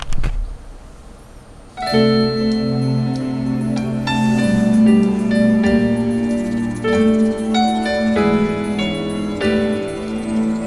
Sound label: with subwoofer